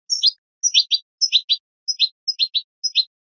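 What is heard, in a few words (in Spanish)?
padreos sueltos, canario; campero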